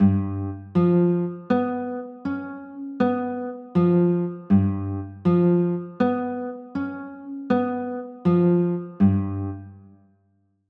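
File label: G sharp 7 chord, guitar; g 7 sharp 11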